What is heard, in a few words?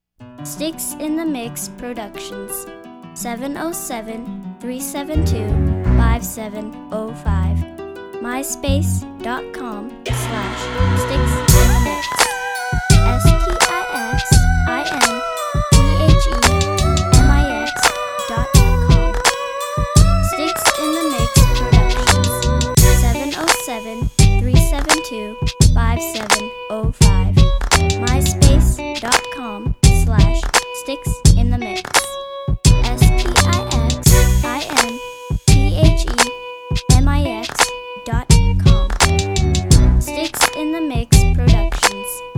clap; west clap